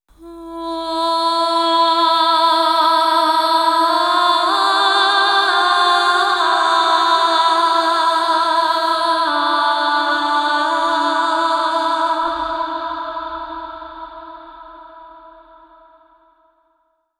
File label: Adlib Vocal - Am 65Bpm (WET); Construction Kits; VOCALS; Ghosthack; SkyRealm; Kit 27 - The Crossing Of Jade Sea